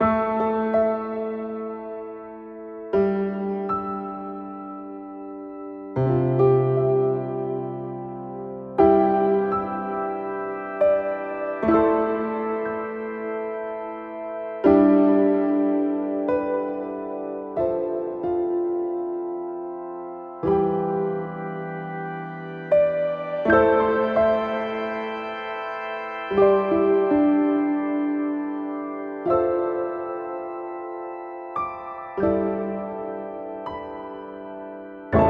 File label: Sad Melody, acoustic